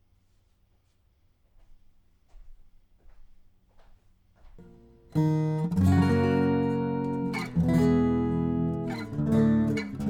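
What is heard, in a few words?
wide mics heavy strings Eb home recording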